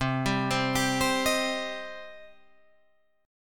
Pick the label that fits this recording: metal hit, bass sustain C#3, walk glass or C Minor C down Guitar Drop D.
C Minor C down Guitar Drop D